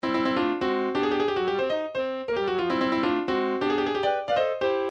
stage clear; nintendo ice climber

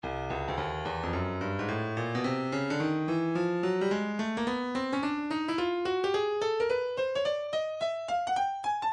breakbeat 108 bpm; odd thip thugs a; Stylus Breakbeat Midi Files

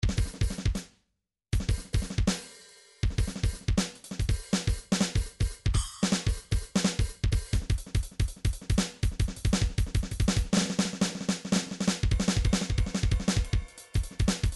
midi drums percussion fill; Midi Vol 4 Drums & Percussion

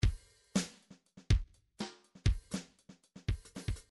midi file grooves

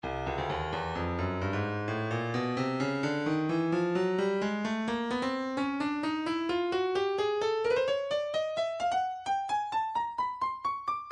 breakbeat 130 bpm; Stylus

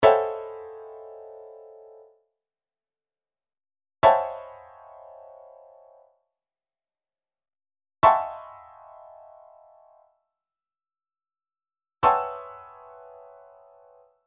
chord lines, midi; Midi Files 1000; Expert A

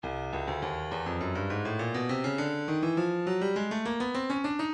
breakbeat 102 bpm; forward b; Stylus Breakbeat Midi Files

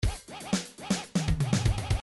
house 1bar

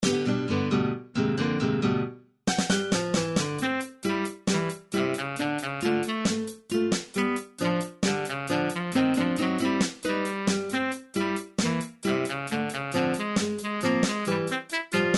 level music; Gameboy Wario Land Level Music 1 (2)

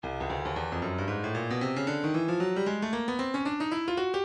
breakbeat midi 114 bpm housebreak; Housebreak C; Stylus Breakbeat Midi Files

